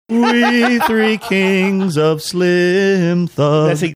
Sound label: singing we three kings of